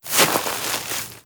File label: Grass Turn; moul-assets